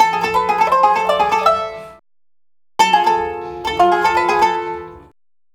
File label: World Lick